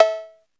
Cowbell; Percs

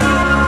Synth Sound; Synth Shots; Vengeance Samples

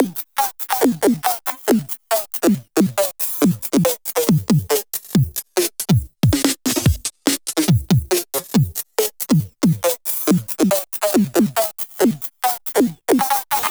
Loops BB 140, BreakBeats; Vengeance Samples

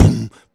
Beatbox Kick, Human Beatbox

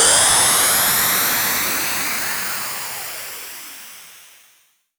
Downsweeps

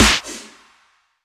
snares; Vengeance Samples

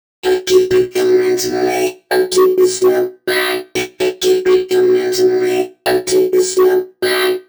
Vocoder Loops 128BPM; Vocal Loops; Vengeance Samples

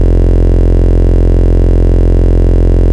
Root F, Bass

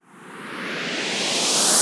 Uplifter

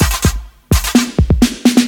Fill Ins 128BPM; Vengeance Samples

